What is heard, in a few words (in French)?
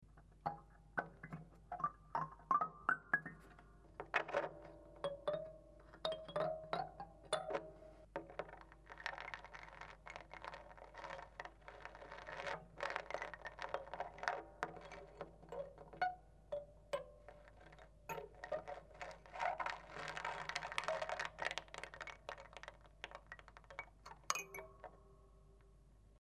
peigne, harpe